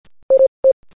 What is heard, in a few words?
Sound Beep